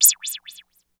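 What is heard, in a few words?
97 LFO CYM-R, LFO cymbal; Phat & Phunky; East West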